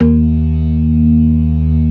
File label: ORGAN, C3 PERC; Keith Emerson Signature Series